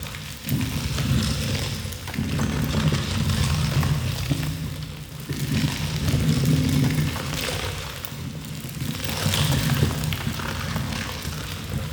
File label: Floating Rock Movement Loop; Warframe; Man In The Wall